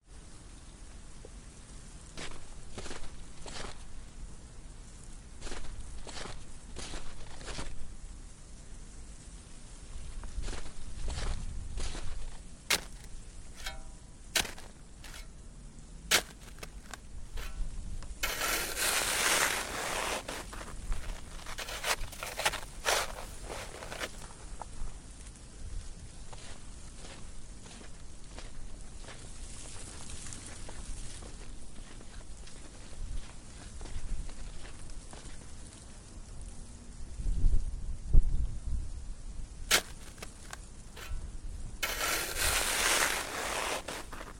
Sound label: winter winter atmosphere